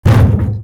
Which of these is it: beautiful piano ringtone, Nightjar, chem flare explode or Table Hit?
Table Hit